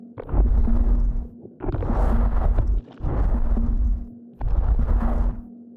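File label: space dragon moving loop; Stellaris; reanimated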